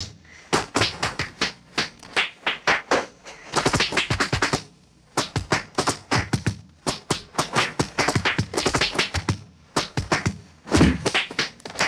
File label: wet rubbery splats hitting hard surface; rain